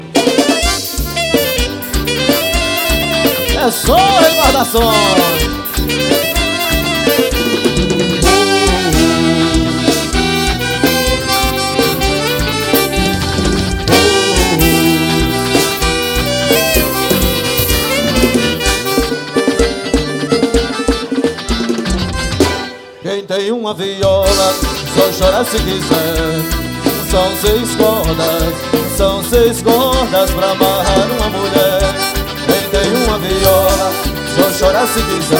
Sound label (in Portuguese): forró seis cordas